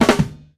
drums play stop; gamerip; The Sims 2

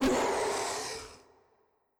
Drac Fire

